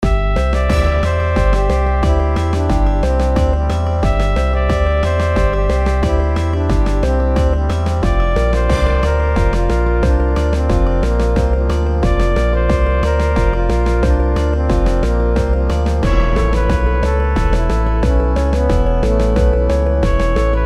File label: Ambient Kingdom